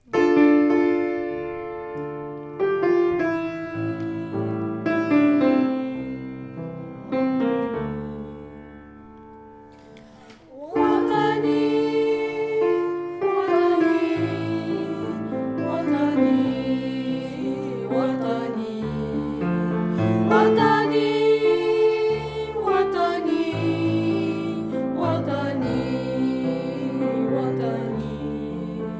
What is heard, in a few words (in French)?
Voix piano